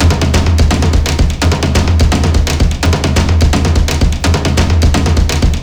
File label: drums, zombat horde; szf